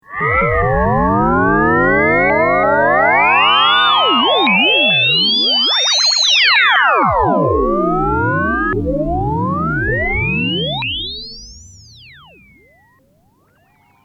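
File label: ring filter delay; blacet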